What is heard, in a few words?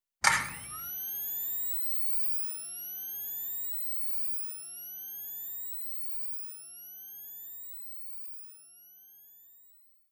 scanner